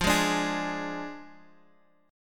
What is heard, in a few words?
F Minor 6th; strummed